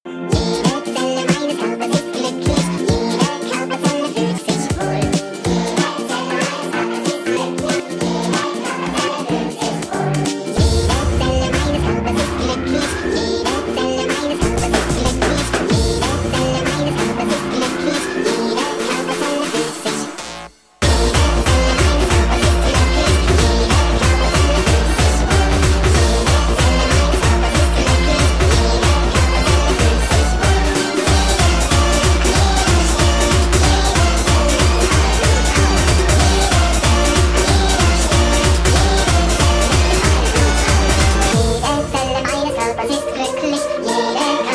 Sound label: Hardcore